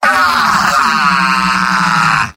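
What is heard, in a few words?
pain critical death; Demoman; MvM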